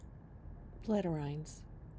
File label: platyrrhines